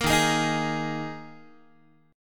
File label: D sharp Suspended 4th, Guitar